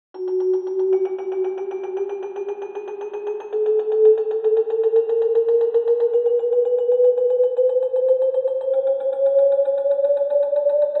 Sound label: Celesta velocity tuning test 120 et with delay and glissando